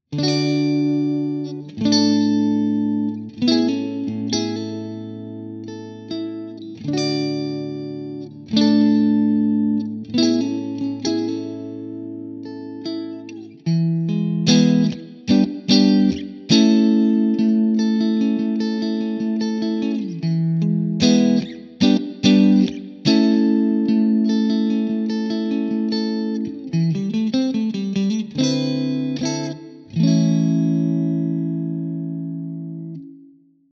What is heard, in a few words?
clean; Modern Clean